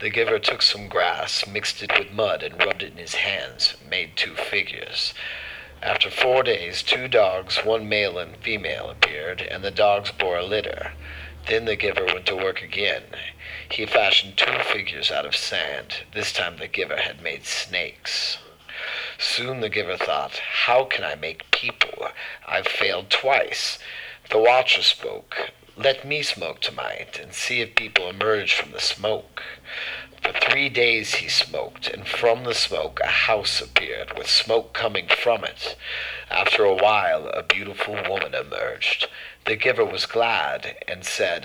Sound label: Vocal Sample; Sonic Foundry; Rondo Brothers Trip-Hop Loops & Samples